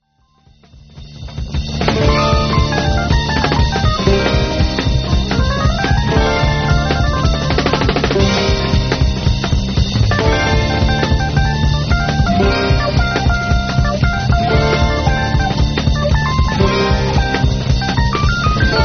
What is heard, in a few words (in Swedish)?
elpianosolo